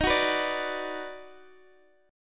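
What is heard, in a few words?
E flat Minor Major 7th Sharp 5th, Guitar, DADGAD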